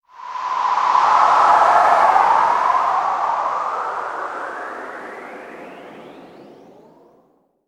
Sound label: windgust, ambient; Garry's Mod; tf2